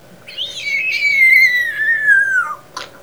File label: splat